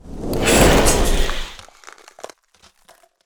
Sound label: Ice Spell Whoosh, Cold Whooshes; Arctic Aura; Zephyr